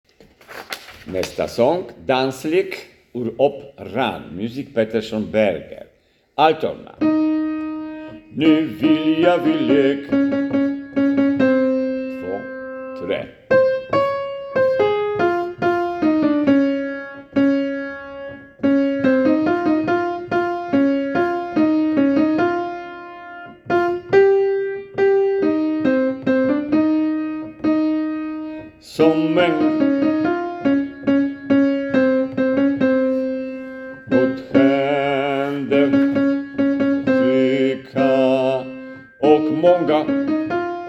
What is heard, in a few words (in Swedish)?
operamusik alt